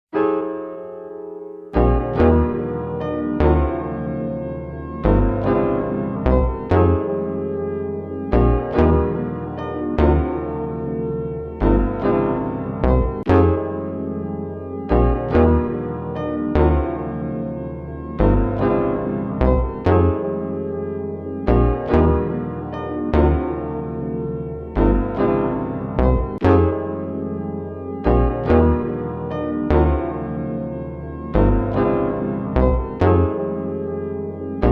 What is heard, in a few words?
Instrumental